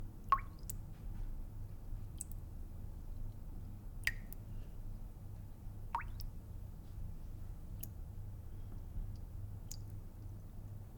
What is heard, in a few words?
Faucet Drip